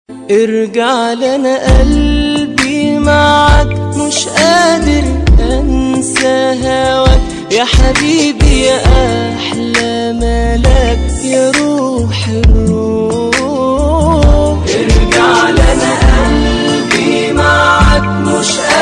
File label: arabic song